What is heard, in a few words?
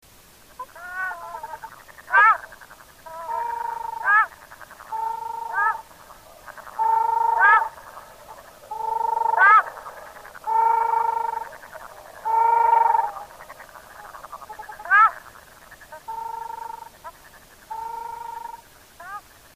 ruddy shelduck